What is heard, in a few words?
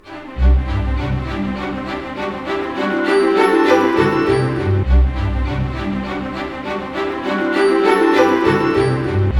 BIKE; East West; Scoring Tools; ORC; Bike Ride